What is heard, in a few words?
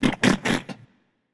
nv tongue; smite; voicepack; hachiman